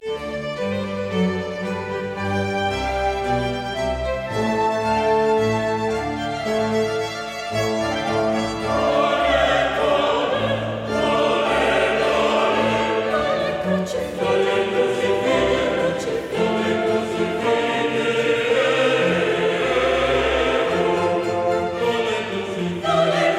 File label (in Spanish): Coro; Tolle, tolle, crucifige eum